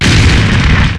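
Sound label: Laser Hit Exterior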